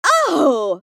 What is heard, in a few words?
Unexpected female reaction